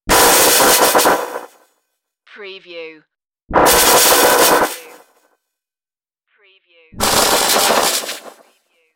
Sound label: electric shock bursts